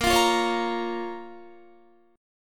A sharp Minor, Guitar; A#m; strummed; 17,20,17,18,17,18

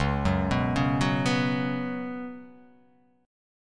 C sharp 7th Suspended 4th C sharp

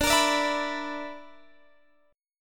B-Minor Major 11th

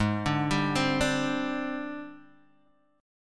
Ab Major 7th Flat 5th Ab, down, Guitar, Open G

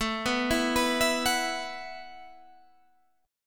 E Suspended 2nd Suspended 4th A

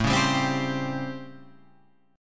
A 7th Sharp 9th, Guitar, Open F; A7#9; strummed; JGuitar

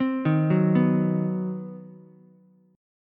C Minor Major 7th Flat 5th down Ukulele, chord; arpeggiated; JGuitar

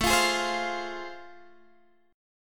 A Minor Major 7th Sharp 5th, down

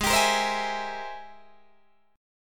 G sharp Augmented Major 9th G sharp Guitar Open D

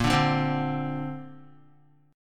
A sharp Major Add 9th, Guitar; strummed

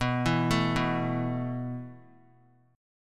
B 7th Suspended 4th B down; B7sus4; arpeggiated; JGuitar

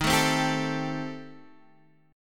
D sharp 6th Flat 5th, Guitar, Standard; strummed; D#6b5; 11,12,10,12,10,x; JGuitar

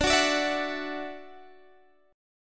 D-Major Add 9th D down Guitar Open G

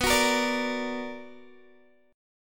B Minor Major 7th, Guitar; strummed; 4E,4A,5D,5G,5C,6F; 19,21,20,19,22,x

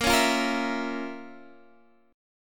F Major 7th Suspended 4th Sharp 5th

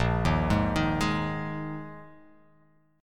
Bb 7th Suspended 4th Bb; arpeggiated; JGuitar

